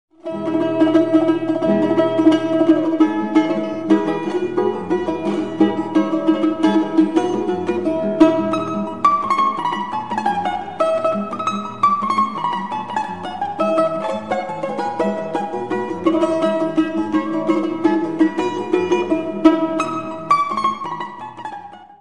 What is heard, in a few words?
balalaika